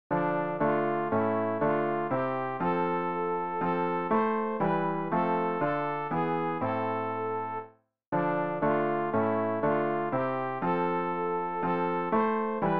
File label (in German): sopran; Ref. Gesangbuch; RG 680: Befiehl du deine Wege